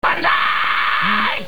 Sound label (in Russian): krik